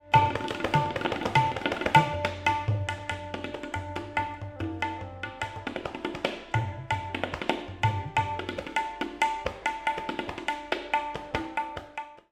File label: tabla; musique indienne